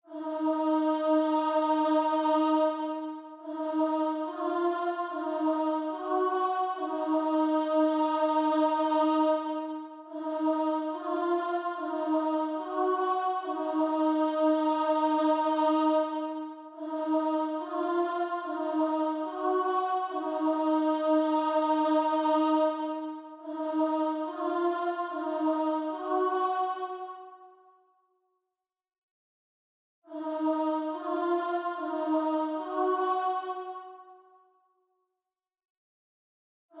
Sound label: 144 BPM D# Min Choir; Loop Stems; Cymatics; 2022 Melody Collection; Drill; Road Symphony